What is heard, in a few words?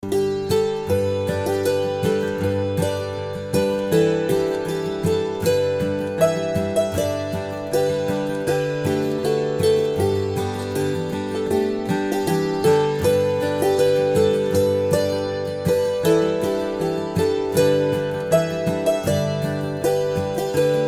Anthem; Cryptogeian